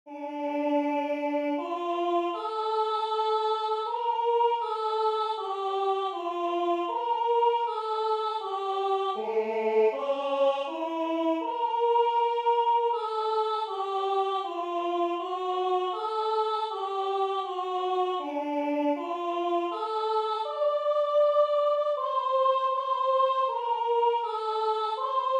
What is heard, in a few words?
duet